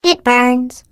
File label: turret shot by laser